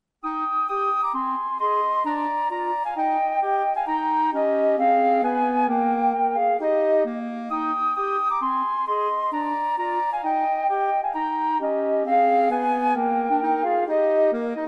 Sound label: Country Gardens Woodwind Trio, 2 Flutes And Clarinet